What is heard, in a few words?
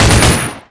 mgun; poke646; hgrunt